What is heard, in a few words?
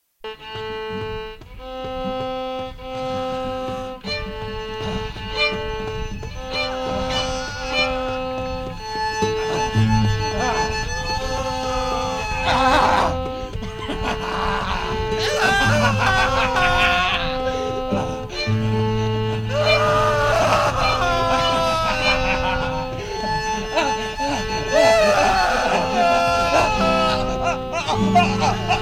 scream